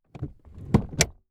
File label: Transportation Chevy Trailblazer Adjust Seat